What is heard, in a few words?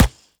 sand, bullet impacts; physics; Half Life Model Pack